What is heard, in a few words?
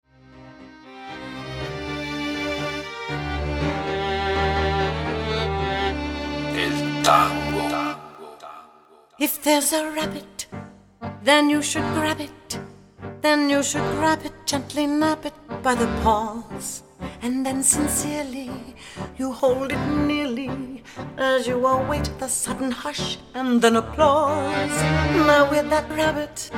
Rabbit Tango